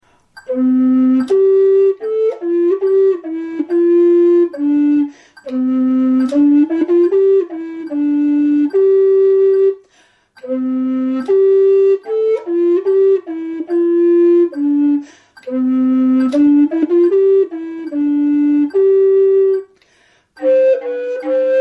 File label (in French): Air de cour basse, FLUTE